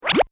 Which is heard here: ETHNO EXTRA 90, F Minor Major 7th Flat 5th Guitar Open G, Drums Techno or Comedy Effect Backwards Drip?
Comedy Effect Backwards Drip